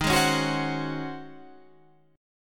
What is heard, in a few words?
C sharp Major 7th Suspended 2nd Suspended 4th, D sharp